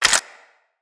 Clip Chamber, Weapons; Lex

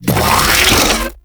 Exploding Arm Charge; Enemies; Warframe; ManInTheWall

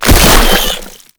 Proto Infested Maggot Pain; Warframe

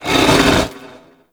Rock Elemental Attack Vocal; Warframe; Warframes; Brawler

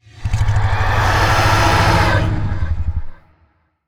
Vocal Idle; Warframe; Zariman; Void Angel

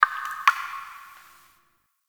Drip, Ambience; Warframe; The New War; Zariman

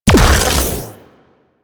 Flame Laser Fire; Warframe; Corpus; Corpus Railjack; Spec Ops Fire Moa

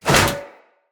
Shield Whoosh; Weapons; Swords; Dendrit Sword And Board

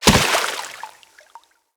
Infested Runner Step Water, Footsteps

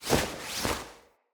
Foley Brush; Corpus Lich; Idle Screen

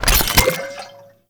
Mech Oil Grenade Create; Warframe; Nechro Mech; Abilities

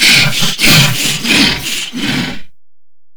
Grineer Hammer Boss Idle, Vocals; Warframe

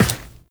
Run Default, Footsteps; Warframe; Tenno

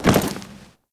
Tenno A Land; Warframe; Cinematics; Lotus Intro

